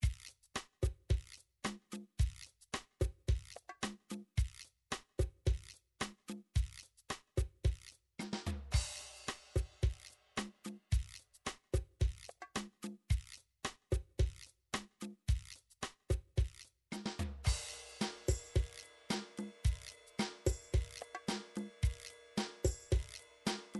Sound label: drum midi rhumba